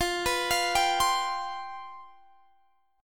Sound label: G 11th F